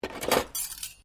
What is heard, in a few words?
Cutlery Item Multiple Pick Up, household cutlery; Kitchen